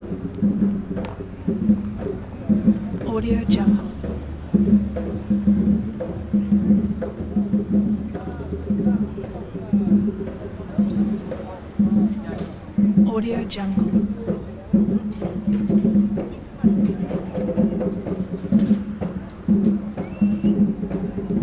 street musician harvard square cambridge ma

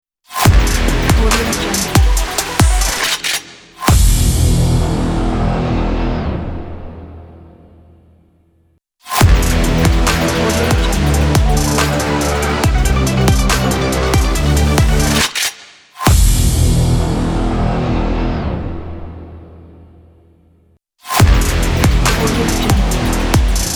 aggressive logo

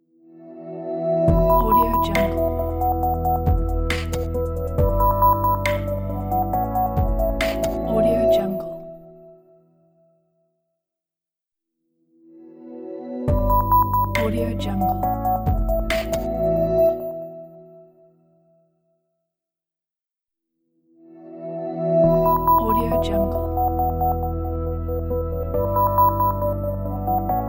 compelling digital logo

